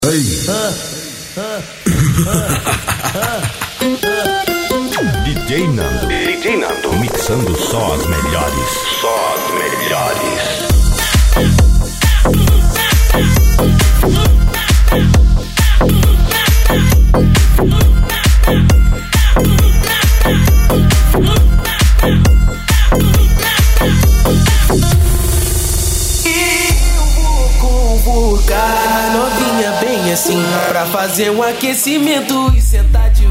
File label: electro funk; na balada